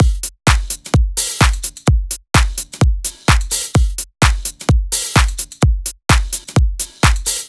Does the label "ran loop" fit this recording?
No